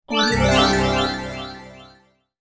oriental fairy spell; game sounds; طلسم فرشته

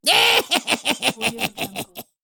silly male character laugh; cartoon

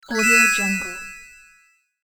interface reveal bells up; interface sounds; زنگ پایان موفقیت آمیز

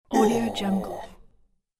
low male kill moan; ناله مرد بعد از چاقو

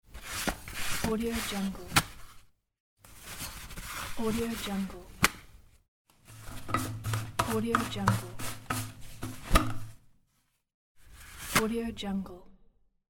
toilet roll paper; domestic sounds

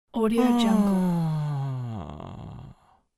tired male moan

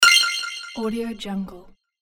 futuristic feature select; futuristic sounds

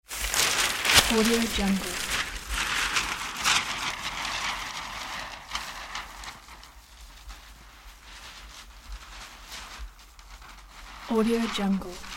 paper kite flying away and flapping up into sky; مچاله کردن کاغذ

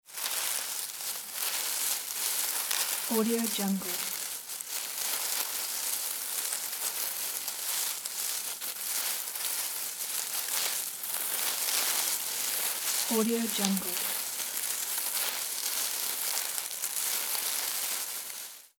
leaves rustling

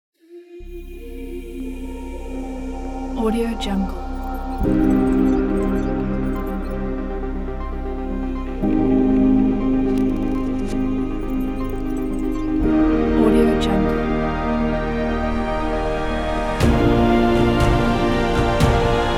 art technology intro; موسیقی بی کلام

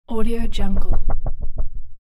foley rattle paper thick carton; domestic sounds

